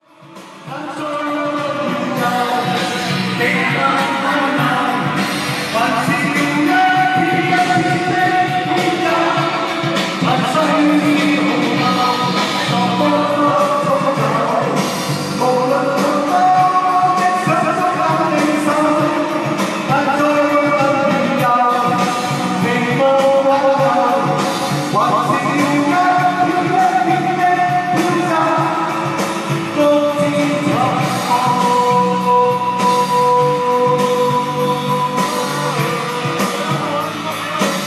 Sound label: karaoke; Malaysia